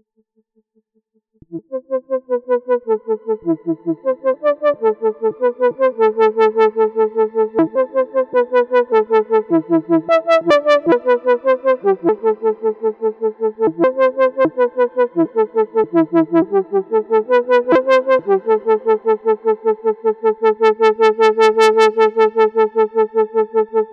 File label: Korg Arp 2600 VST ARP Flute